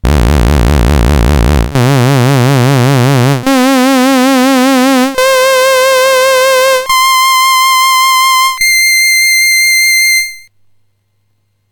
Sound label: lfo nonlin osc frq mod; midibox sid v2